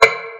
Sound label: Clonk